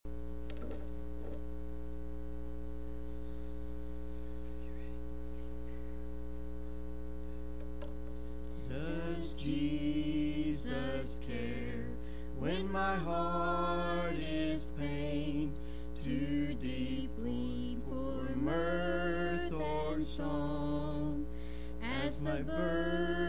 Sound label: singing; May Youth Night Singing